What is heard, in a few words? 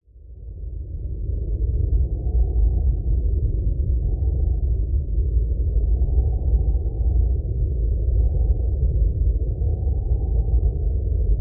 nature wind constant strong howling dark storm